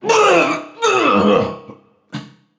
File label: clear throat